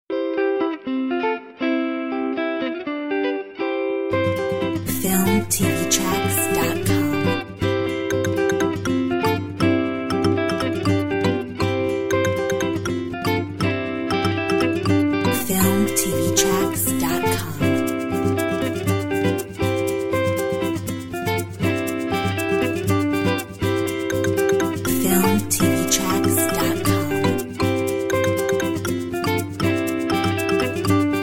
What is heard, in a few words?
African Township